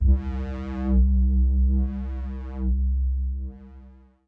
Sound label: Bass Sweep